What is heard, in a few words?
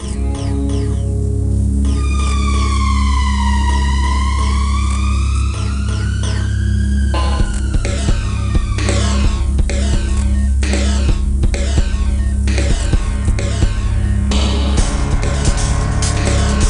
Industrial Music Loop; bigfoot collision course 2009 nds gamerip